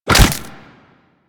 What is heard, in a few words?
Desert Skate Attack Fire C; Warframe; Attacks